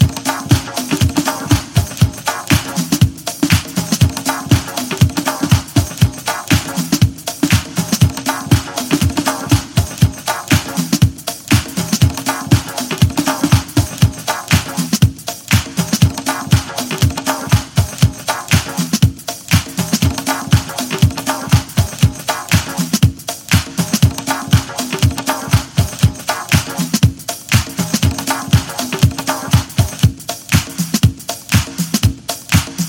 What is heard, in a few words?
Bonus Beats